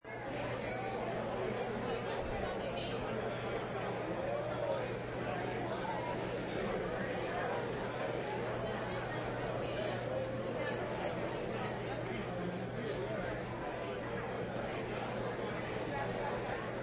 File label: Crowd Quiet; Monster Truck Destruction; Android